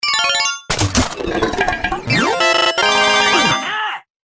donation notif; dlive

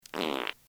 fartt

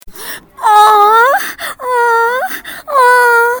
moaning

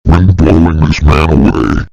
WIND BLOWING THIS MAN AWAY

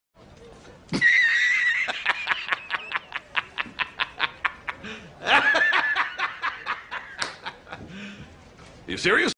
boss laugh; spiderman

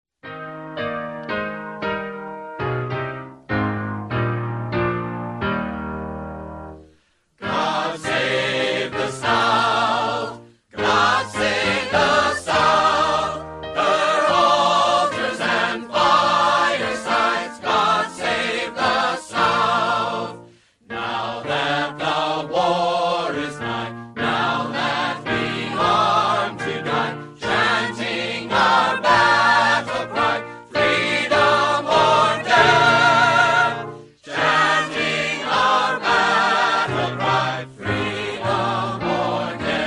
Confederate Patriotic Anthem; God Save the South

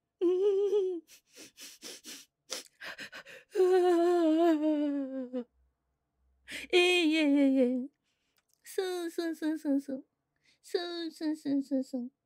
lion cryin

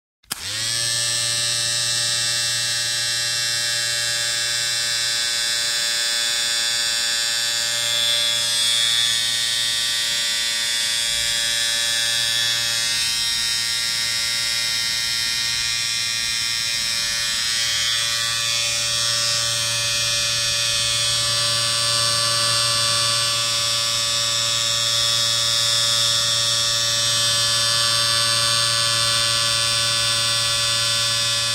shaver sound effect; Voicemod

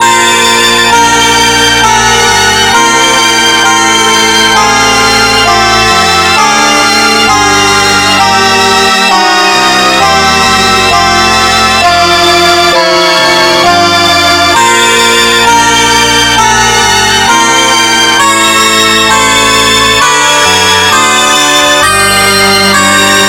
String Orchestra and 2 Harps

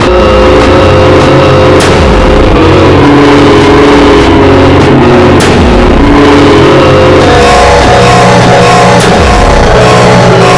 Spanish Romance

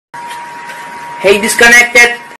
disconnect

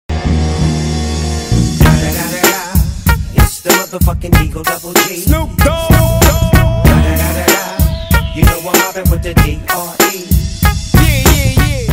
weeeeww

